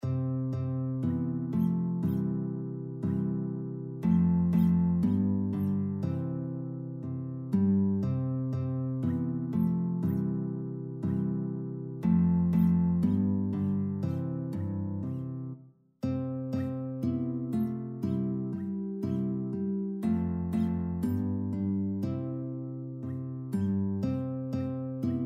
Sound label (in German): Englischer Tanz 3 Gitarren leicht